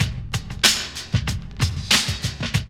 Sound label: LOOP; E-Lab; X Static Goldmine 2; akai